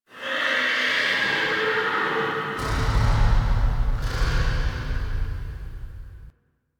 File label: Ghost Horse; Dagath; Warframe; Ambience; Clan Dojo; Dojo Misc